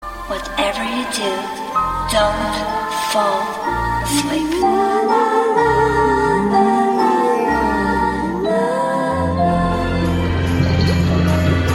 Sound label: Horror Movies Mix